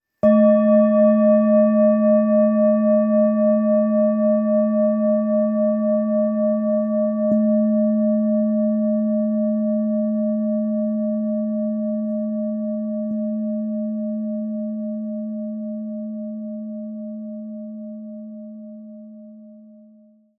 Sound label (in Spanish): Cuenco tibetano brillante LA, Terapia de sonido y meditacion